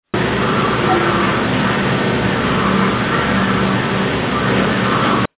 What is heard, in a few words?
evp amplified; jail